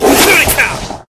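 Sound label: Shuriken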